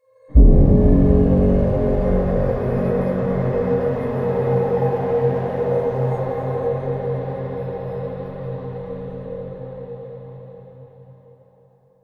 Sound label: Power Down; Bunker